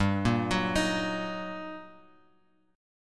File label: G Minor Major 7th Sharp 5th, guitar chord; GmM7#5 Chord {3 1 4 X 4 X} arpeggiated